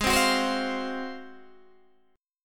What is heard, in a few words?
Ab 13th down Guitar Open D